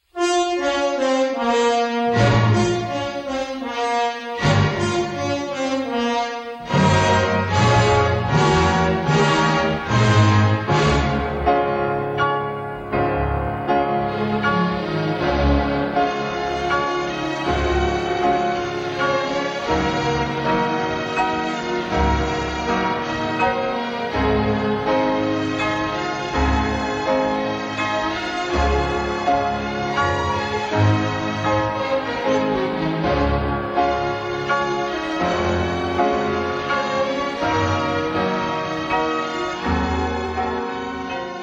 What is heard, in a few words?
Piano Concerto; tchaikovsky